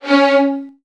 C#1, VN 16TH, Virtuoso Strings; ILIO; Kirk Hunter

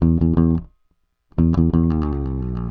Rock, Bass; Bass X

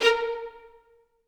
A3, Orchestra; AKAI Advance Orchestra Upgrade 97 Vol.1

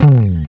bass, disco; Best Service ProSamples vol.48 - Disco Fever; AKAI

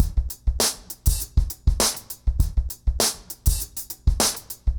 Remixed Drums 100BPM; The Roots Drumloops

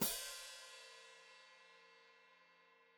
Hat Closed Open, Hi-Hat

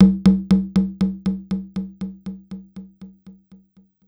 Conga Loop, Korg Wavestation-SR

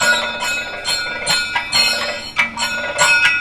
KATAK, Indian Traditions

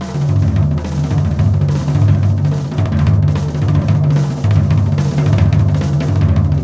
VIBE JAZZ LO, LICK; Steve Gadd Drumscores; GEMS

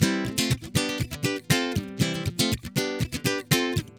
GUITAR SALSA

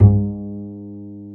Bass, Roland

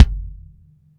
BD, Bassdrums, Roland TD-20

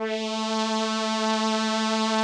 SOLINA, String Machines

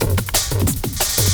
LOOP -L, Drum & Bass, 180 BPM; Ueberschall; Drum & Bass Resonance

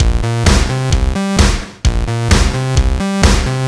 130 bpm full, combi loops, nu dance electro; Zero-G; Soundclash; Thrasher

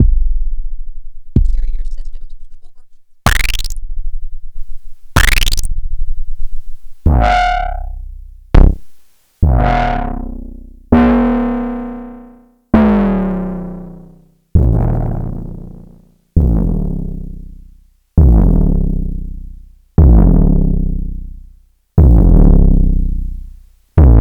Kick Distorted Feedback; Sliced

